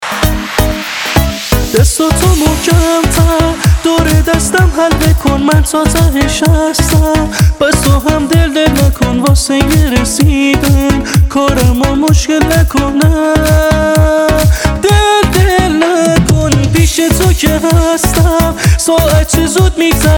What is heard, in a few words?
music